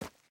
earth, step

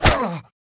hurt

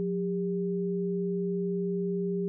stereo test

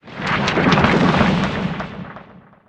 rock, ambient outdoor

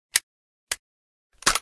gauss bore, weapons

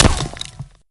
sand, bullet collide

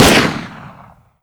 desert eagle shot, weapons

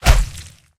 flesh attack hit

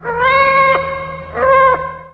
cat, outdoor ambient

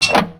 electro box switch; zaton

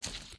step grass

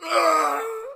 killed

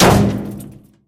barrel collide

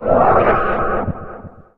boar, outdoor ambient

